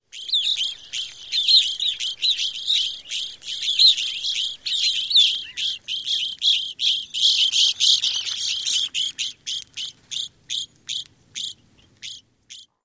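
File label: flock calls, Evening Grosbeak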